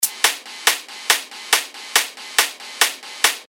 techno loop 140 BPM; Diamond; revealed techno top loops vol 8

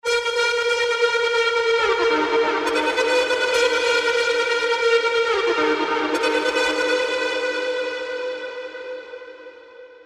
Trance Gates; Signal Fade; Revealed Serum 2 Trance Gates Vol. 1

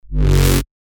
F#, bass shots; Blank; revealed bass shots vol 4

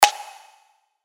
G#, percussion; Regigas; revealed percussion vol 2

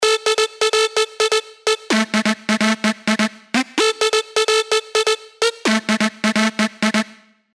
LD, dirty dutch leads; sylenth1; Buggin' Out; revealed sylenth1 dirty dutch leads vol 1

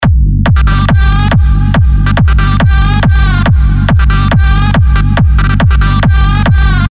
big room techno drops; Chemical Mechanica; Revealed Serum 2 Big Room Techno Drops Vol. 1

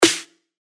Snare; Horsepower; revealed claps snares vol 2

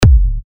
TECH KICKS, D; Odyssey; Revealed Techno Kicks Vol. 10

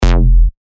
Bass Shots F; Basscamp; revealed bass shots vol 3

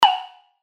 Pluck Shot F#; synth shots tech house; Galactus; revealed

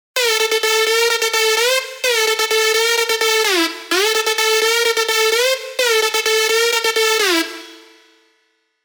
LD Talking Dirty, Serum 2 dirty dutch; revealed; dirty dutch vol 1

